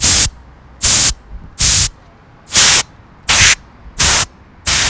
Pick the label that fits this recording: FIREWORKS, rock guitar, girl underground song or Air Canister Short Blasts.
Air Canister Short Blasts